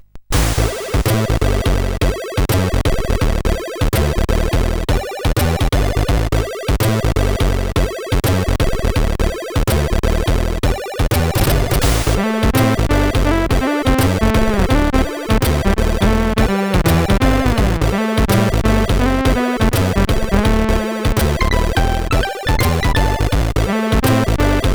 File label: Propeller Plane, CSG8580R5